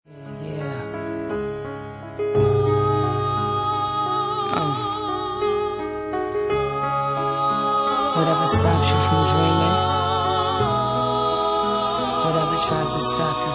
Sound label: piano